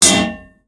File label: tank hit small interior; tanks; War Thunder